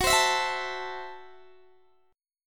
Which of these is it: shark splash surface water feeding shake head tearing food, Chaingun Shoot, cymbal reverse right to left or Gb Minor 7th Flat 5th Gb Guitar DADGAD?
Gb Minor 7th Flat 5th Gb Guitar DADGAD